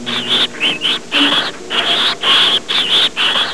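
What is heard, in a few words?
squeak, rat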